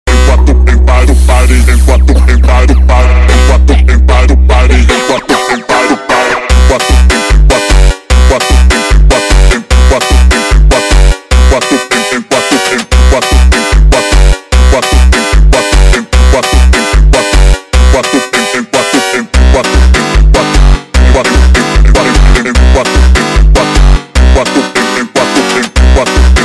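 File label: sped Up, phonk music; funk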